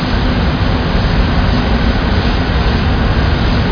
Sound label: amb ind ust